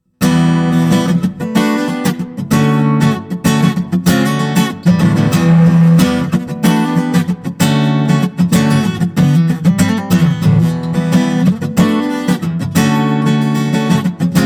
Fishman Aura Spectrum Dreadnought Percussive Ibanez AW54 Blend Comp